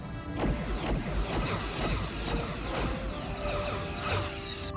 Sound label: Photon Torps; trek